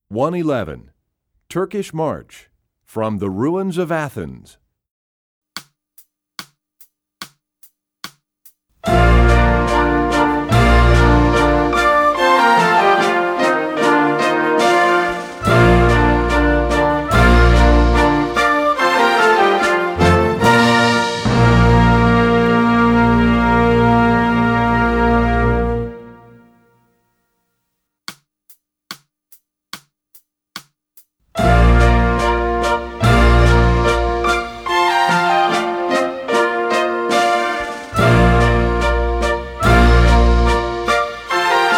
Turkish March; EE2000 Book2

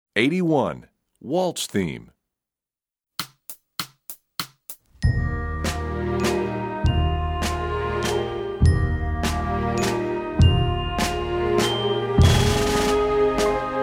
Waltz Theme, Percussion; EE2000 Book1

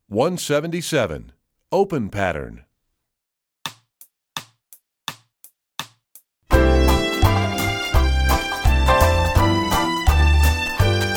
Open Pattern, Strings; EE2000 Book2

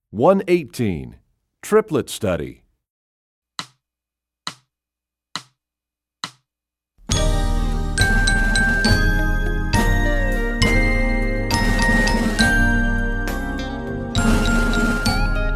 Triplet Study, Percussion; EE2000 Book2

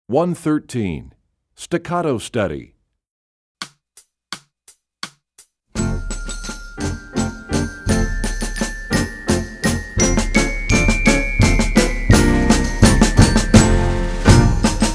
Staccato Study, Percussion; EE2000 Book2

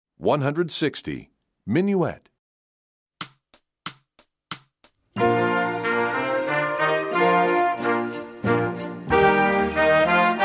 Minuet; EE2000 Book1

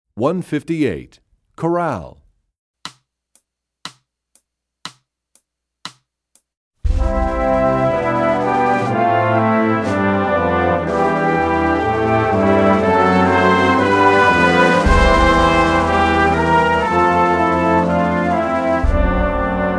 Chorale, Percussion; Rubank Studies; EE2000 Book2

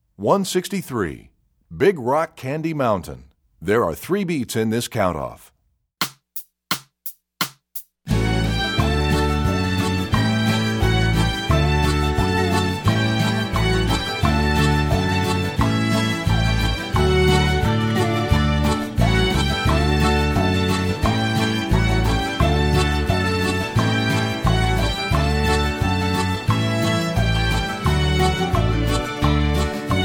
Strings; EE2000 Book1

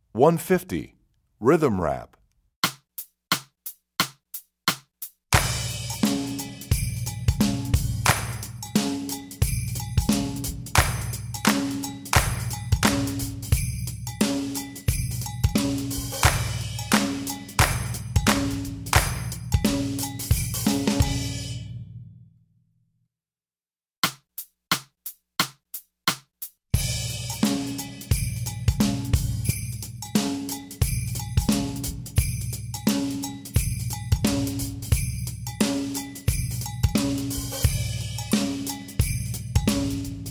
Rhythm Rap, Strings; EE2000 Book1

